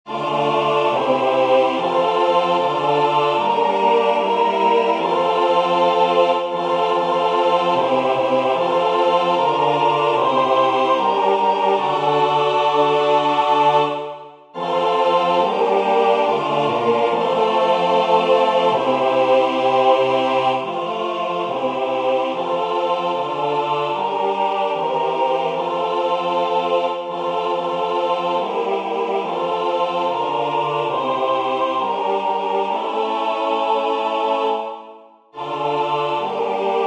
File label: Agnus Dei all parts; singing divine service iii in parts